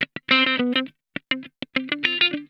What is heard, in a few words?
Funk Guitar; DIG IT; Zero G - Funk Guitar